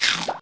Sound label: bite; Feeding Frenzy